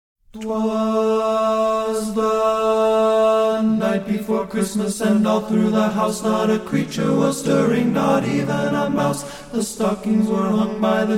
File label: Christmas Chopsticks